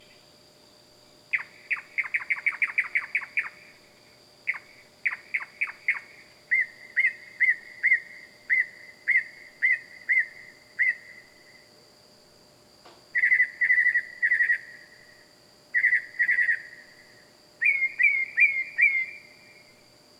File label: Loud Night Bird Animals Wild